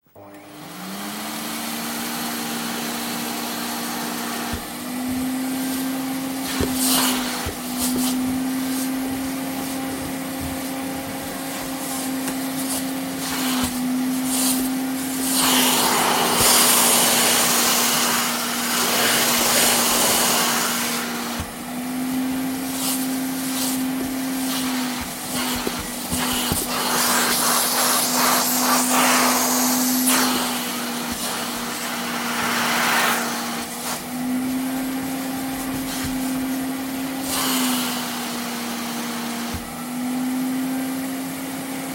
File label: white voice